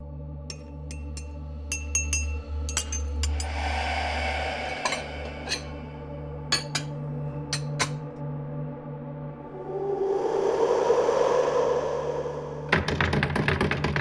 Dining room; Toy Odyssey The Lost and Found

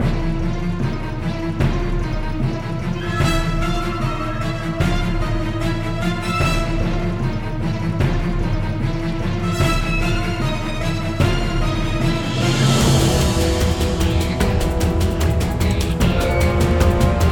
BGM Battle; hidden dragon legend

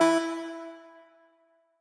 Quasar Move E; Mixolumia

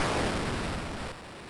Critical Stop; Liberator

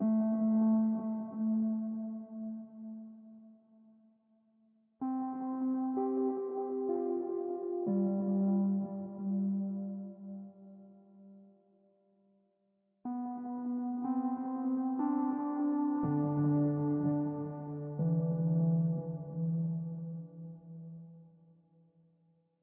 Stingers Slow

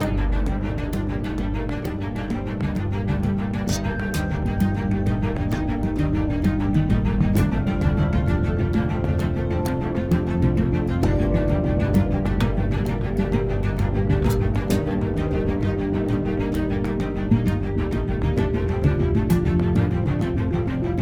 Loop Action

